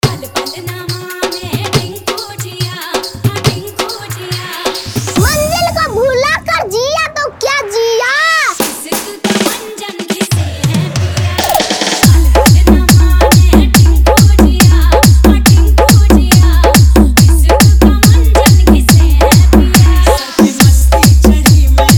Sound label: Hard Vibration Bass Mix